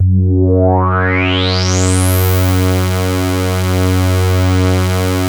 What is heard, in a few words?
MOOG, BASSES